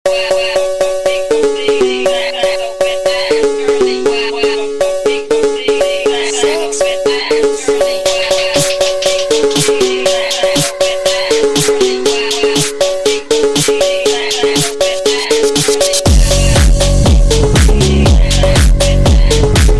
PITCH Phonk; ringtone funk; آهنگ زنگ فانک

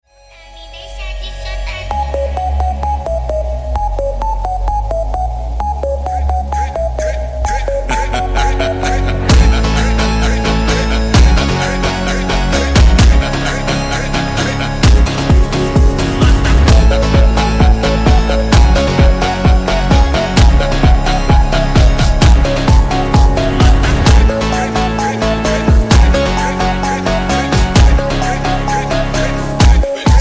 FUNK; ringtone

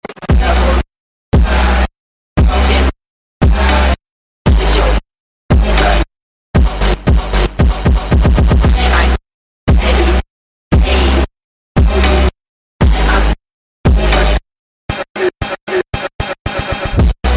Phonk; ringtone funk; آهنگ زنگ فانک

